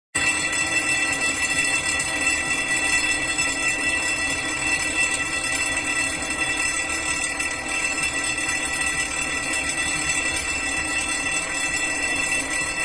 Campanella Scolastica

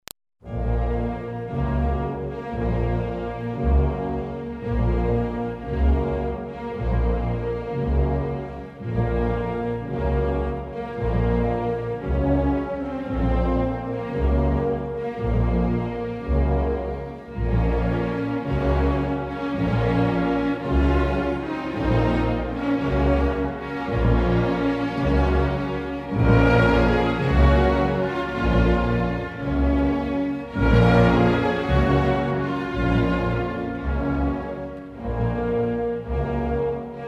Marcia Funebre

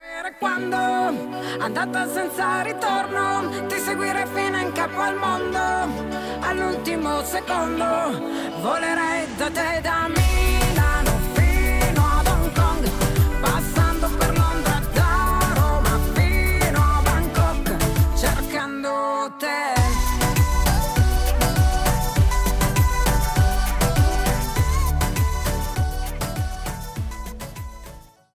stacchetto

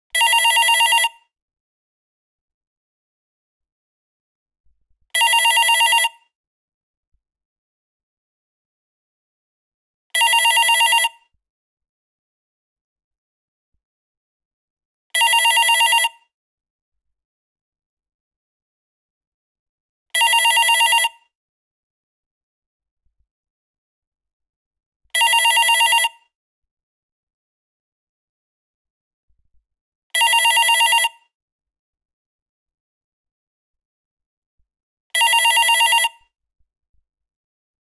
Telefono

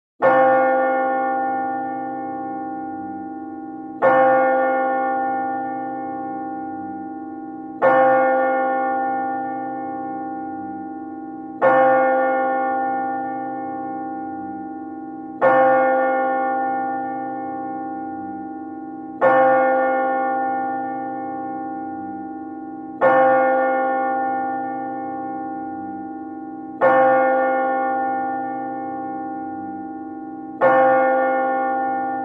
Campane A Morto

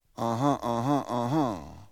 ahahah

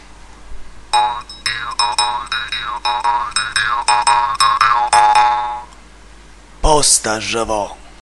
Messaggio Posta In Arrivo Per Cellulare, Suonerie cellulari

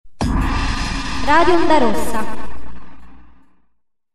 gong

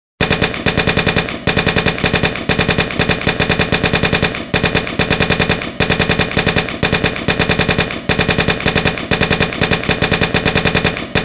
Rumori Di Guerra

